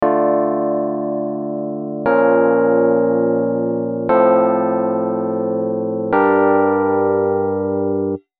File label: rhodes